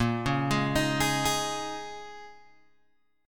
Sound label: Bb Minor 11th Bb down Guitar Open G